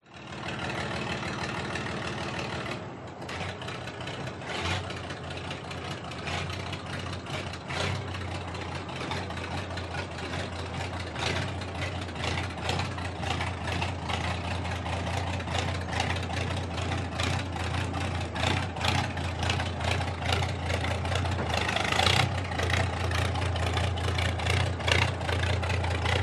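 warfare tank matilda mark 2 1939 manoeuvre slow out of hangar engine revs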